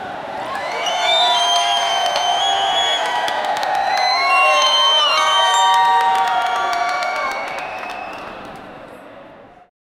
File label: seat high school are, arena